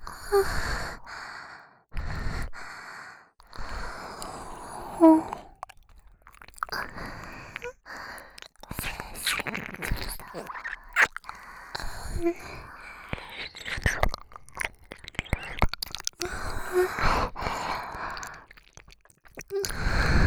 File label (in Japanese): 耳舐め 喘ぎ; 精子ちょうだい♪; 深雪